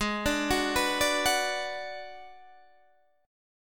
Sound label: Ab Minor 7th Flat 5th Ab, down, Guitar, DADGAD